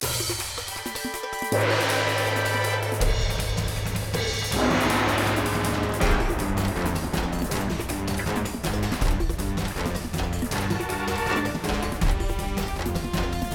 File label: Action START